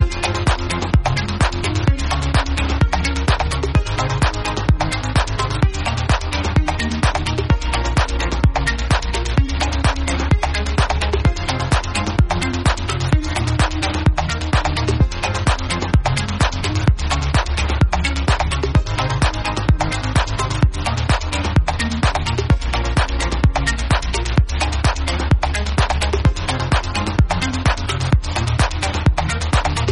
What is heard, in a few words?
Synthpop